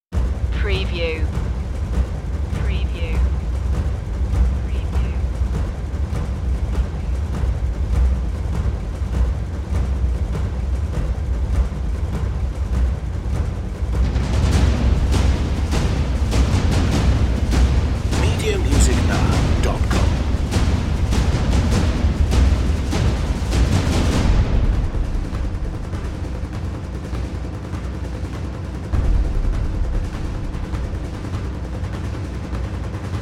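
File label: percussion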